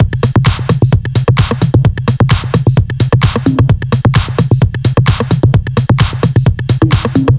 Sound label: 130BPM Kit; Pressure 1 Kit